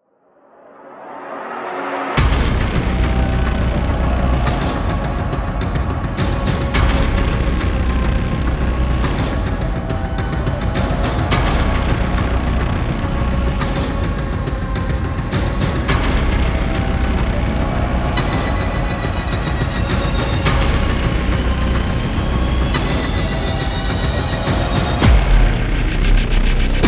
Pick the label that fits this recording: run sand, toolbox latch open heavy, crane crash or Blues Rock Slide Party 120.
crane crash